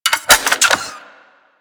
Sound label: Microwave Pistol Reload, Weapons; Grineer